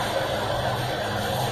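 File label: down run, elevator; menards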